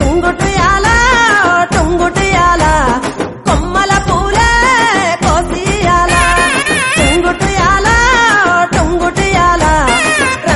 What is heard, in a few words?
telugu song; bathukamma